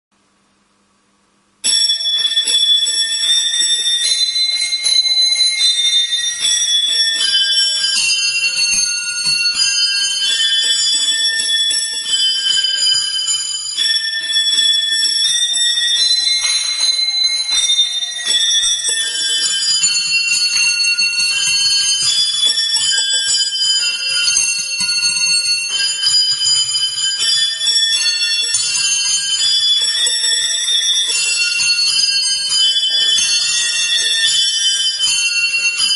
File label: brass